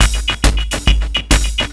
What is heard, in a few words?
percussion loops, Techno; PS-45 WAV Techno ID